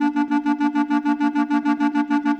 Clarinets, Orchestral; Sonic Foundry (Sony Creative Software); Orchestral VOL-2; Modern 22

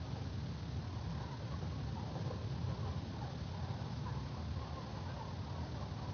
Ambience Loop, outdoor

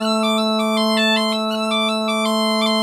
AMB PA, BAGPIP; USB Soundscan vol.42; AKAI

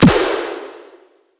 snare doosh

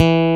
E2, GUITAR; AKAI S-Series CD-ROM Sound Library VOL-8

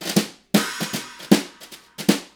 Live Shuffle Fill 152, Lounge Drumz

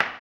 HAMBONE, Vocal Blues Soul

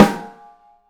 OPEN BRASS, 3D Drums; 3D Sound

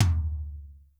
REAL T1; Crypton - DrumBase5000; R70 KIT #1

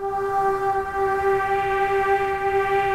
SYN WHISPRS; Pro-Rec - Synthsonic; Z1 PADS

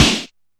Snare, Alesis DM5

